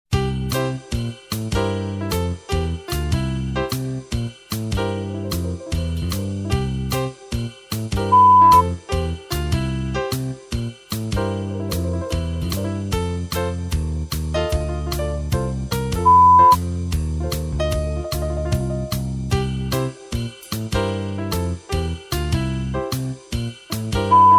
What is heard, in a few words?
Happy Song